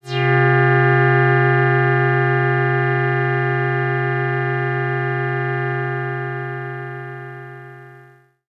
SYNTH Soft Multi Brass Filtered

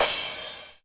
Crash 909 Clean

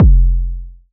KICK F; HolyWooferz

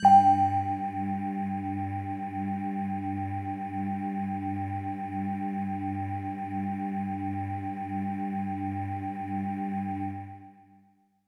Bell Air Kawaii G-1

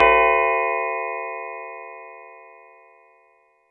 Bell; EFM

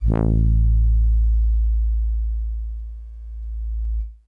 Soft Horns DX100 A1

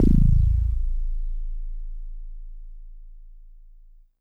Mono Bass DX100 A1

